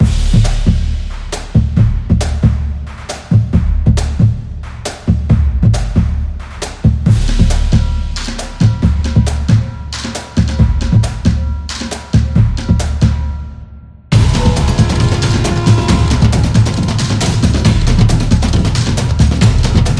Soccer Drums; percussive nation vol 6

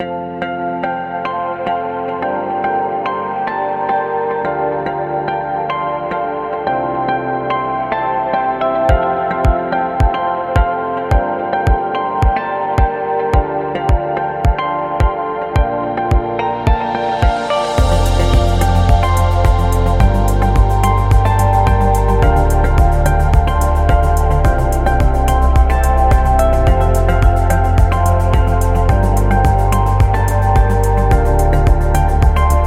Educational

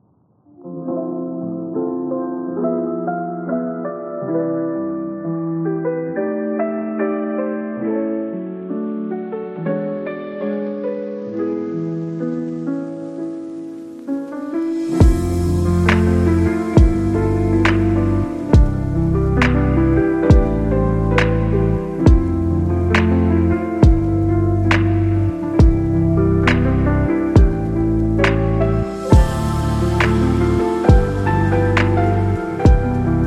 Chill Jazz Lofi

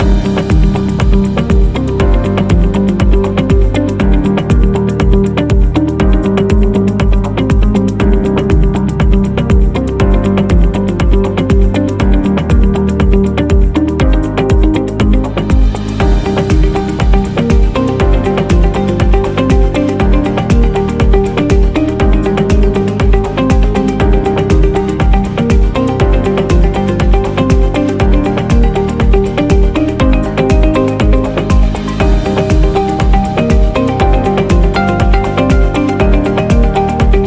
Fashion Loop; technology

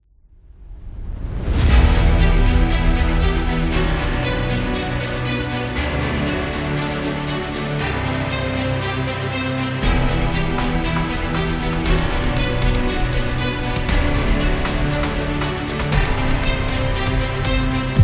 Dubstep Epic; epic trailer

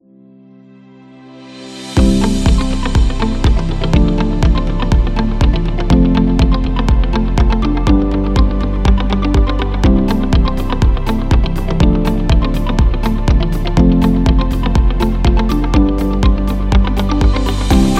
Uplifting

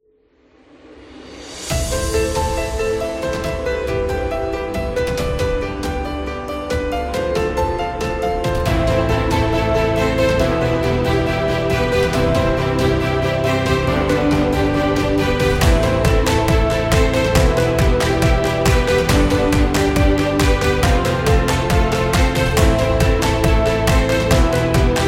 Epic Motivational, corporate music; corporate music vol 16

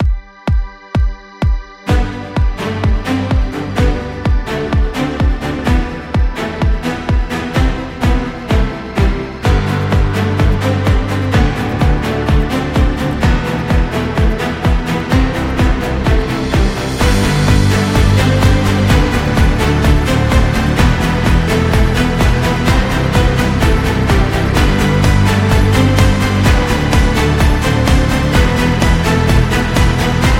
Epic Corporate; corporate intro

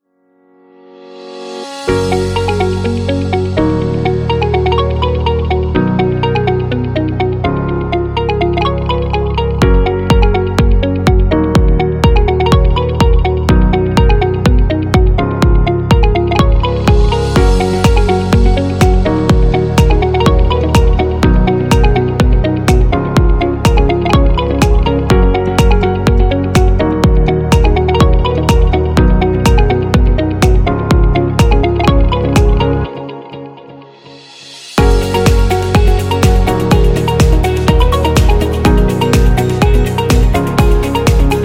Celebration; corporate interview

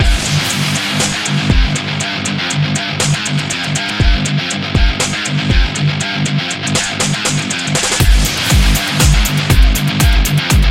The Metal Dubstep

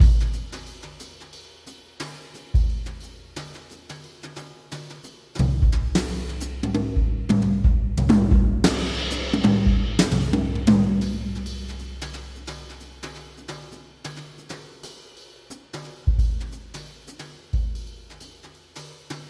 Energetic Jazz Drums; percussive nation vol 6

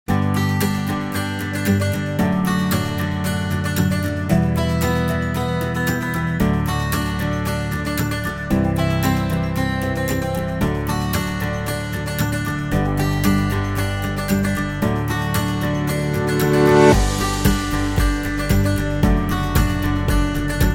Happy Acoustic Guitar Background; happy music vol 9

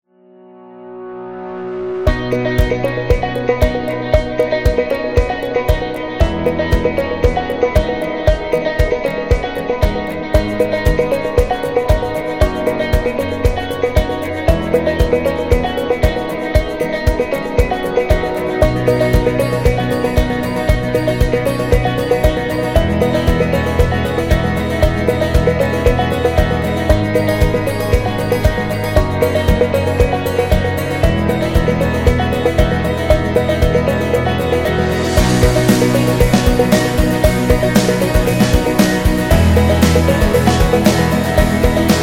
Indie Rock Strings, happy music

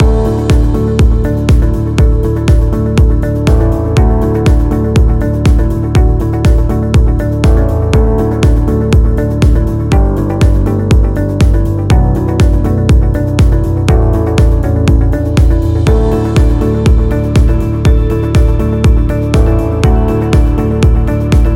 Corporate Minimal Loop

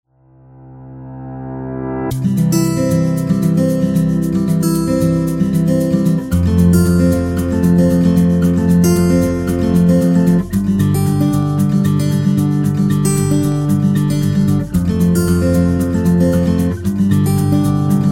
Positive Tone; corporate music vol 8

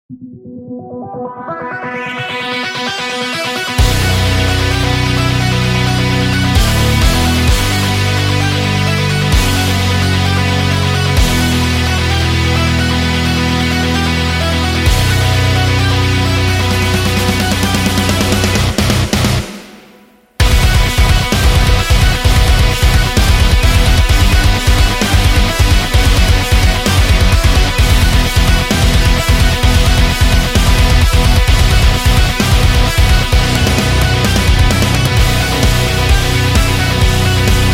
Heavy Metallic

